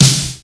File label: Snare Rock; Zero-G; Soundclash